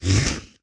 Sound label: wind draco main, monster